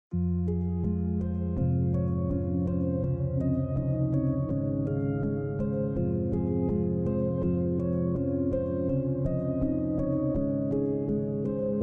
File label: Free Rap Beat